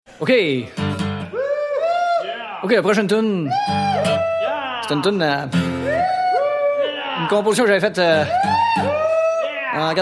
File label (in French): Chanteur wooooo; Humour